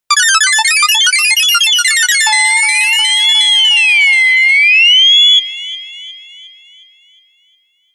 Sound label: whistle of pan